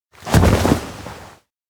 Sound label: sit drop soil; anemoi